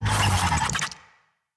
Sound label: Infested Big Ashen Vocal Pain